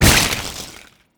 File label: Enemy Die; helltaker